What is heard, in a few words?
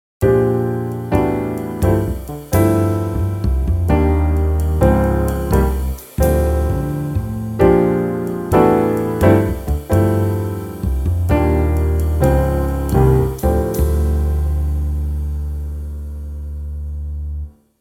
jazz